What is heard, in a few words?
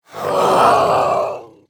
Gold Tusk Cub Death